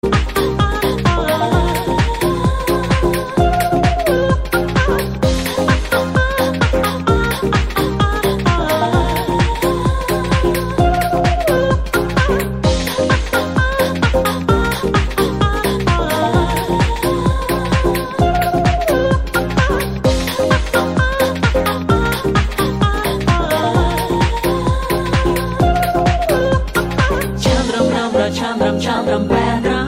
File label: chum drum bedrum